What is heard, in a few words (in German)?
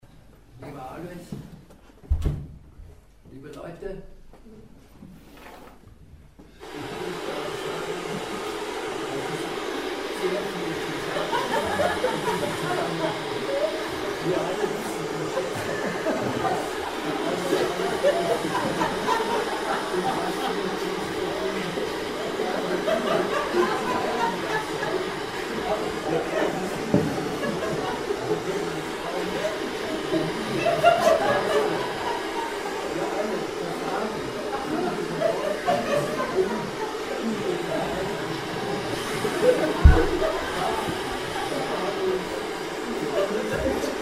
glueckwuensche u rauschen